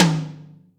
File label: Room, Acoustic Tom